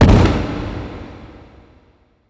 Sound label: Skill faithhit; 100rogues